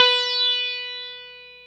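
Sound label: ELECTRIC SITAR B2; Jones Elec.Sitar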